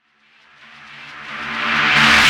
Hutter Swell; KJ Sawka - Drum n Bass & Beyond; Swells

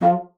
bassoon F3; C BASSOON; Xsample VOL-6

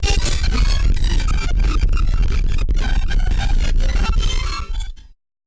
Battered Synth; malfunctioning synth samples

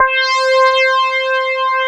SYN SOLO, Roland, digital; Sound & Vision - Gigapack I CD 2

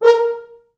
4 FrHorns; East West; Quantum Leap Horns Sax; 4 FrHorns MOD

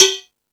Percussion, Drum Machines

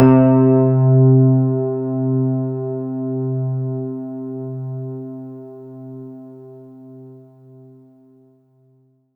C3, synth; 90 s Sample CDs; NiceBeats; SynthLine VOL-23

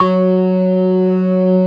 ORGAN; Keith Emerson Signature Series; ORGAN COMBOS

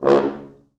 4 FrHorns; C#1; East West; Quantum Leap Horns Sax; 4 FrHorns MOD

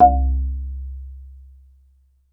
BASS MARI, Pitched Percussn, Bass Bamboo Mrmb; E-MU Producer Series Vol. 7 – Old World Instruments

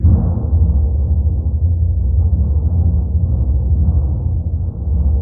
TMP C#, Synclavier Percussion

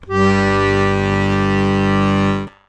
BASS G 1; Best Service; World Colours

